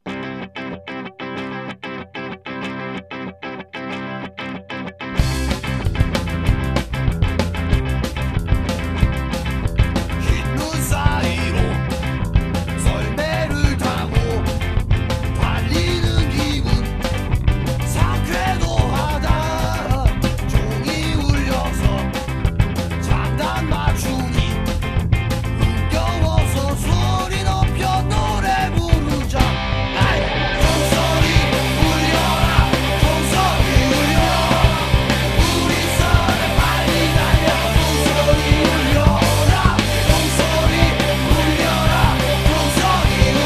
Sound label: Jingle Bell